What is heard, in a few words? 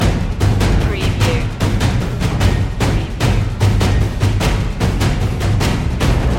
epic percussion loop